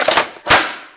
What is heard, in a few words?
weapon pickup; Daikatana; items